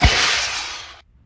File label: Fire Die Out; SFX Kit; Foley - Misc